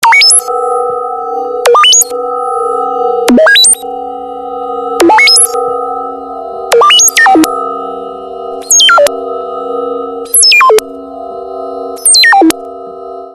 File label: Unknown Transfer Sig